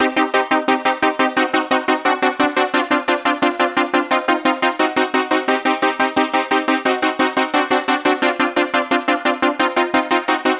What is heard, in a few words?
OBX; OBX XPAND; Masterbits - Tekkno Trax; SQ LOOP 175